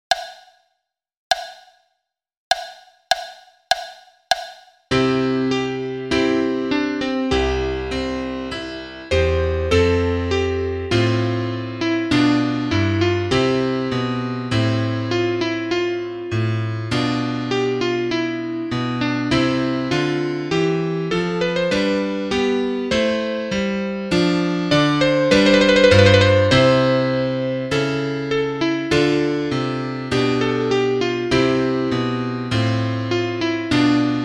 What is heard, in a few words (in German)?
Klavier Solo, trompete; Adagio